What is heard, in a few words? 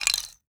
Crafting UI Tab Button; User Interface